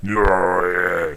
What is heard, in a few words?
growl; shamblie; npc